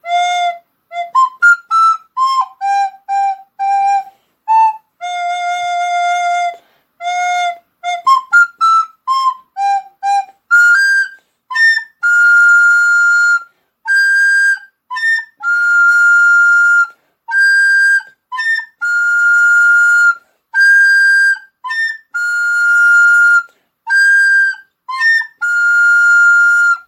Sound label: ringtone